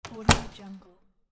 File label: old door close